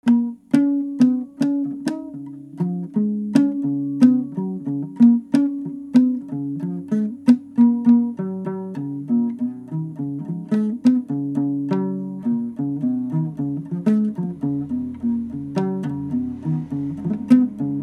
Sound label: Jarabe